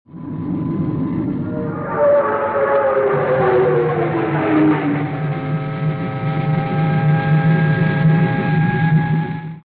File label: Speed dive